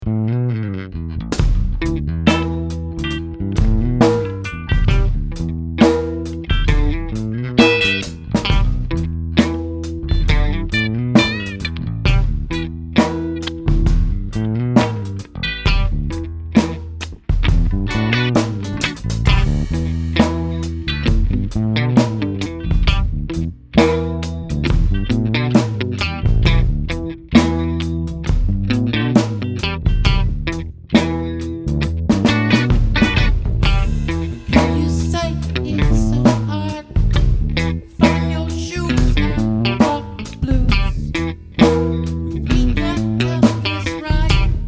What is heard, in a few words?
Walking blues